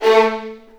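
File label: A3 violins; The Ultimate String Collection; East West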